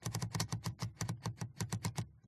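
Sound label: typewriter roller knob movement; mkh8040; pmsfx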